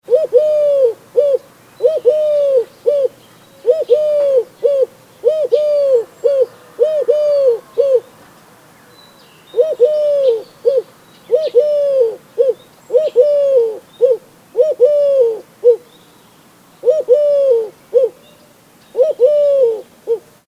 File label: mourning dove call